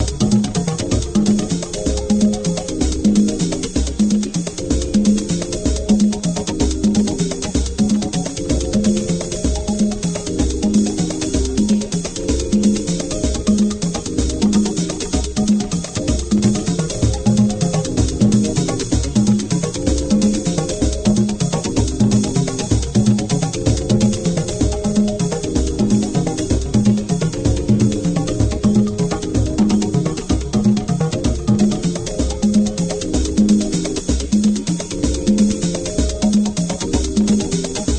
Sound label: Instrumental; Detroit